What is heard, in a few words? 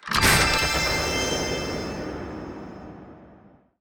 door